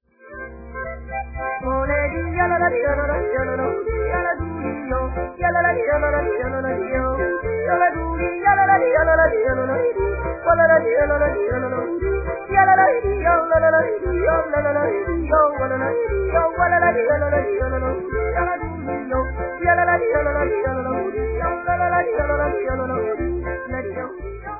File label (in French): la yodleuse